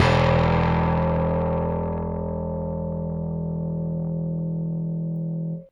Rickenbacker Bass; Airwindows

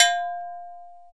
LO AGOG, Latin; Roland - Rhythm Section; Latin 1

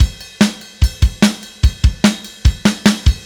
Silos Beat Fill, Indie Rock; Sonic Foundry (Sony Creative Software); Indie Rock Anthems; Session 11 - Silos

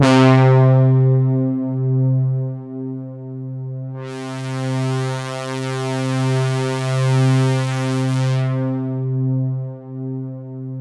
C2, JUNGLE BRASS; Roland JV 2080

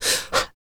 FEM PERC, Vocal Jazz FX

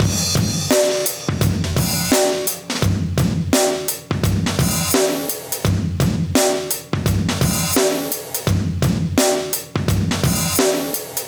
85 live breaks

